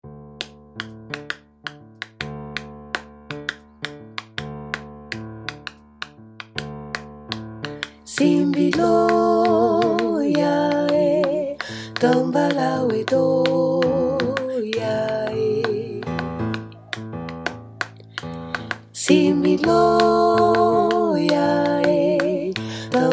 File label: tutti, singers; Outdoor-Events; Deblozay; simbi dlo